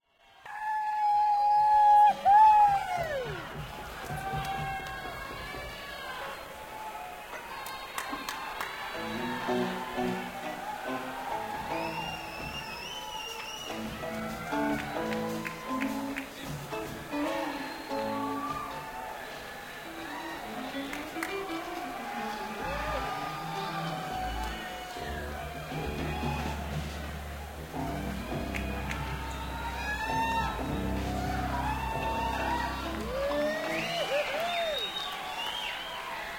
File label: Crowd; sony ecm270